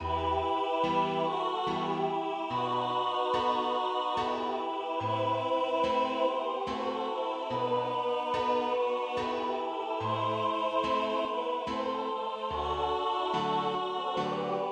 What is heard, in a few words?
Under This Stone 3 part Em Bass